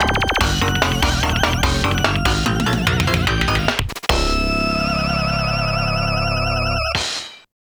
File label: Game Start (FM)